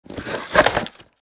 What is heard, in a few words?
Wicker basket lid rustle and close